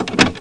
Tuer; TKKG 4

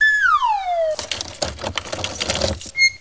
Schlüssel

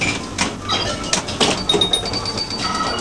buero

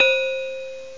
glocken; gmplay

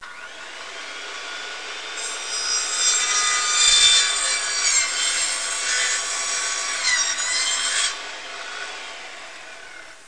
SAEGE; Kunsthaus Tacheles

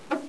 Schrank; Loewe1

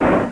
Schublade

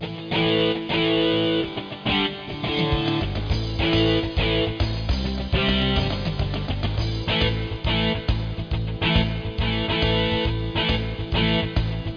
Titelmusik; cannonhills

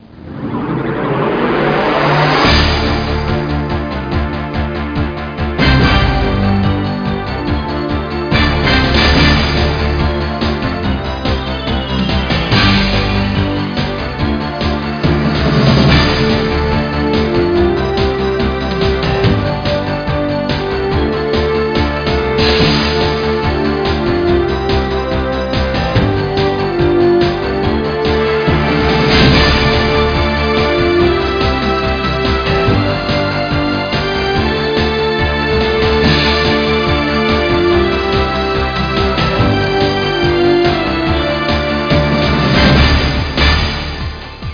musik

